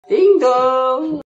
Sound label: ding dong notification ringtone